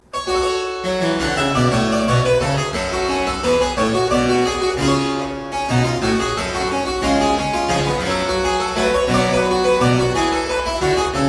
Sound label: Suite no 9 in G Minor - Courante I, spoken; Johann Mattheson; The Twelve Suites of 1714